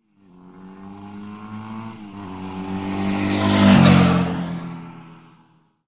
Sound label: motorbike; Blind Drive